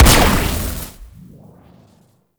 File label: Amplifier Chassis Fire; Weapons; Operators Amp Chassis Part A Fire C; Warframe; Amplifiers